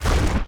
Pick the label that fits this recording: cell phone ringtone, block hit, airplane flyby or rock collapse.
rock collapse